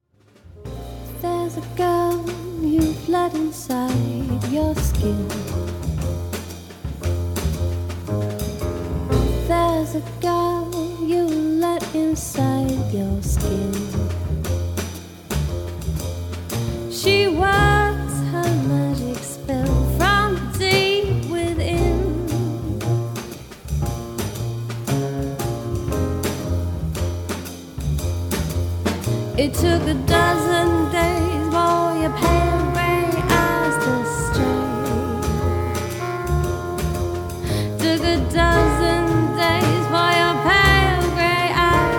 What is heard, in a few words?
Blues